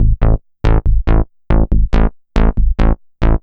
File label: bass, dance